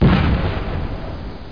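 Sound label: cannon bang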